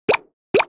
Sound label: Deer Sled Walk; Seer; GamigoNA